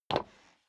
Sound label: step sneaker wood creak single stair up